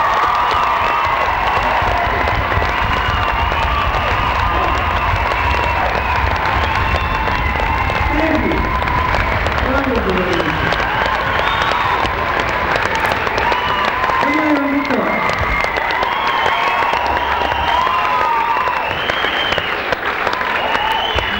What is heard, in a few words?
crowd